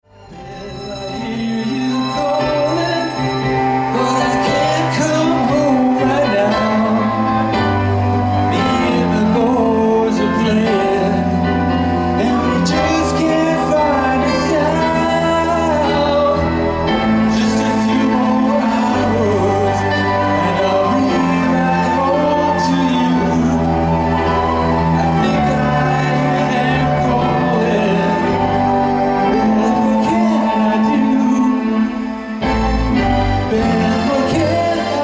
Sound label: Tribute Band; Las Vegas